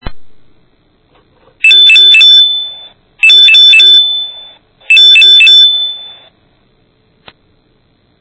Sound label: bell